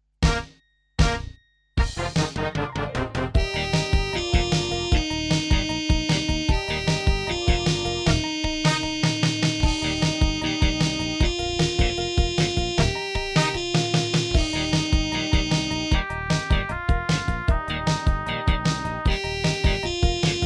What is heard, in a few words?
Rock Eb 152; tenor-horn